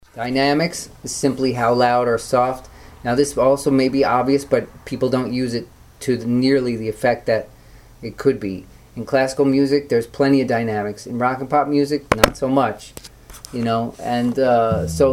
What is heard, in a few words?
varying a melody